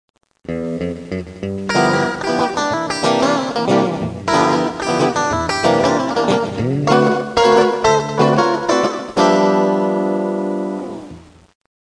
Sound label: rockabilly, guitars